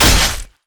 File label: anchor chain enemy; nautilus